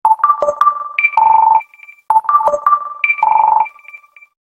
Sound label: Minimal Tone, ringtone; Simple Tone